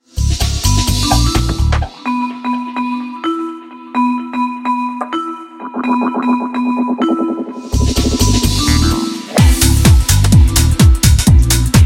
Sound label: SWING